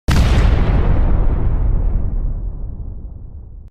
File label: Explosion, Boom, Loud Boom